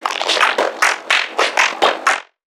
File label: Creatures Vocalisations Infected; Five Nights At Akers; soma; npc construct